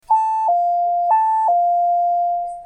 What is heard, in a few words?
doorbell ring; easy bell chime